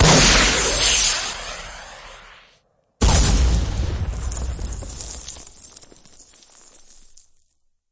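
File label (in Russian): запуск и взрыв ракеты фейерверка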